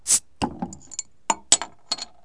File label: Bottle of Beer; Household; Foods